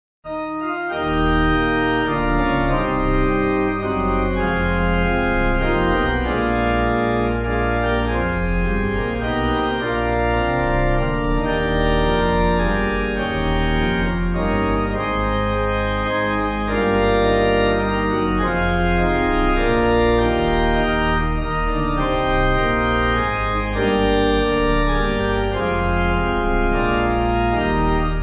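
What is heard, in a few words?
Pipe, Pipe Organ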